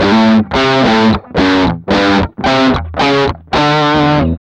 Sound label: GUI, licks; 110 LICKS